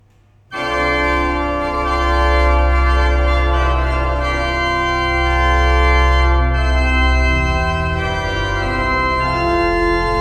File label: easter day improv; postludes